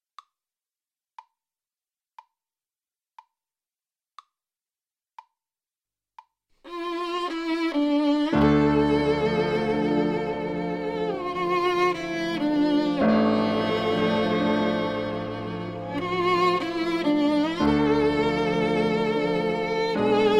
The gypsy violinist

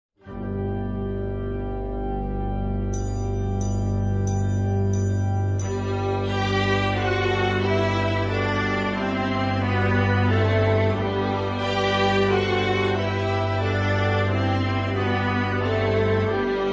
Chimes

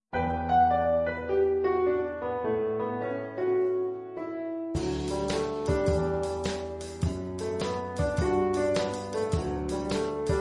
Sleepy head accomp, viola

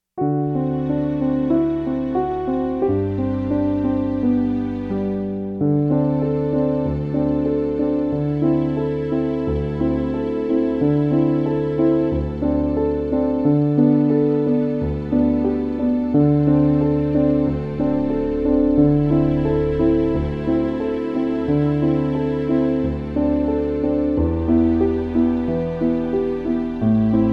Cradle song accompaniment; heypr for violin bk 2 silver

